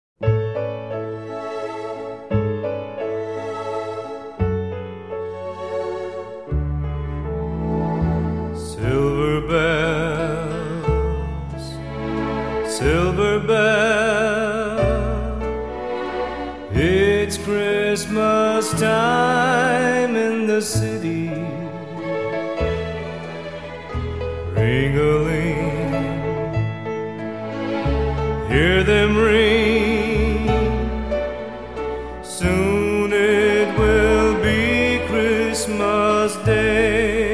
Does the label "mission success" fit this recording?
No